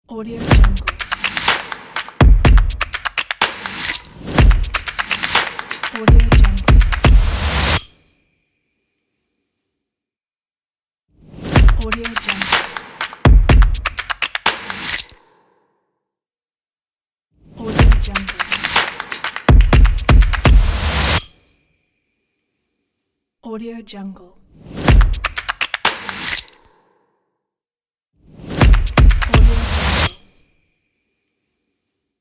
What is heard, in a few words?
a business logo